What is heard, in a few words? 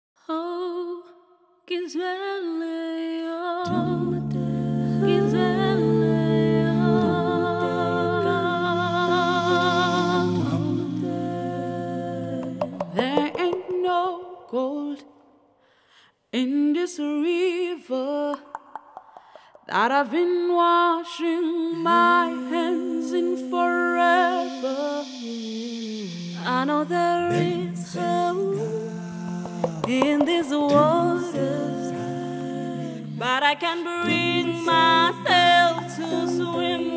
Youth Choir Acapella